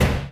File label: Chunky Door Opening Single; Deathrun